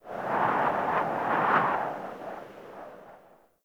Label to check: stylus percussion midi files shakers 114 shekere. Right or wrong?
Wrong